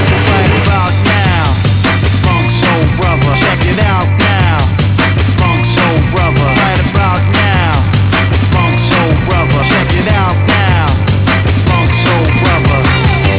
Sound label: toon skank; surf templeoftoon